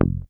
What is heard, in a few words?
HOF MUT, Hofner mute bass